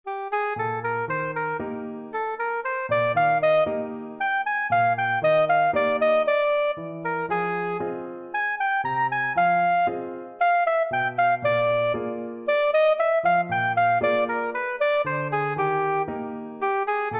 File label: soprano saxophone